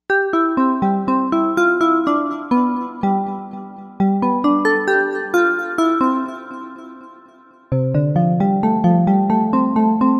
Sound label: Novation UltraNova; Factory Patches